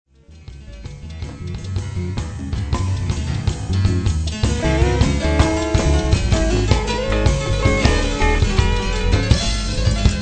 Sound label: Waves